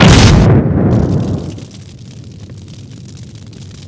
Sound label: explosion feu